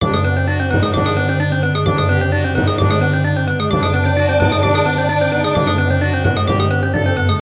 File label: count up; Book Of Lords